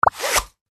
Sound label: map close